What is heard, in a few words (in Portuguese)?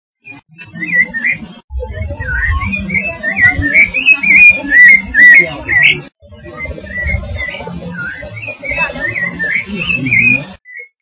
sons natureza